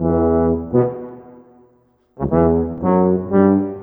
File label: Trombone Tuba